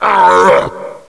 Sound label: Pain, zombie; cstrike; Nemesis